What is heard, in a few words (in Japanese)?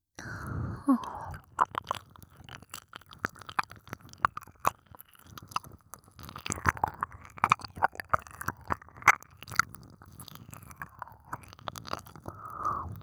耳舐め, ASMR; 好き好きDキス→耳舐めオナニー→我慢できずにフェラ